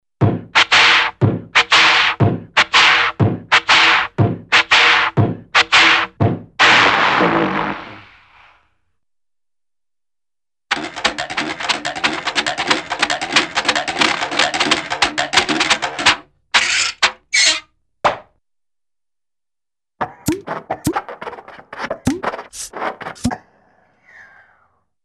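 Funny Engine Steady with rhythmic Booms & Chugs - Dies, cartoon; Hollywood Edge; Cartoon Trax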